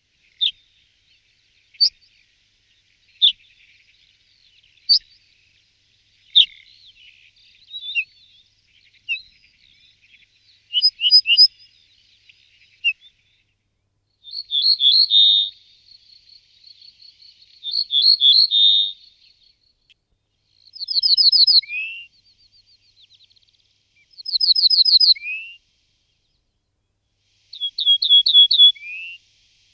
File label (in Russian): kriki i penie sadovoj ovsjanki